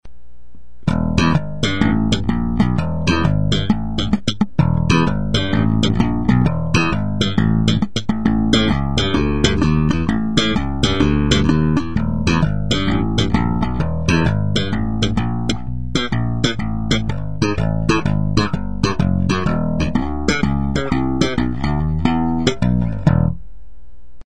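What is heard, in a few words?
slap, bass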